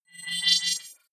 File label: wobble swell shimmer; sweeps-swells